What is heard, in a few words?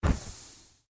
Sack; Blind Quest - The Frost Demon